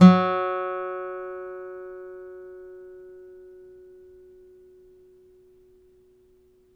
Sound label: STEEL P F#2, Synclavier, GUITARS; Synclavier Sampler Library